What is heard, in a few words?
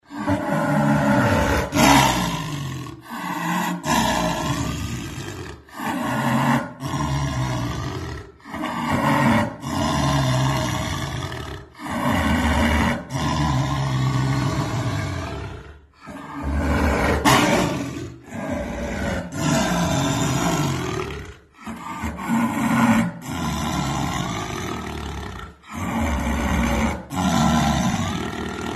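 ryk yaguara, jaguar roar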